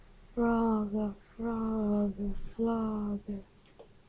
frog

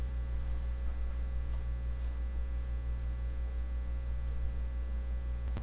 woodcarving

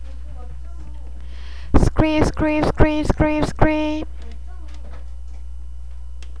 scream

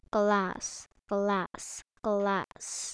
glass

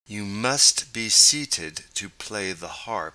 harp

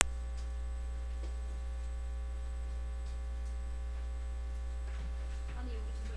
knock

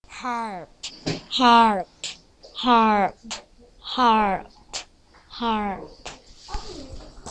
harp

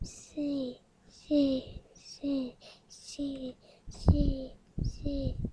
sea

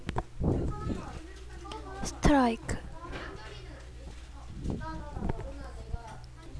strike

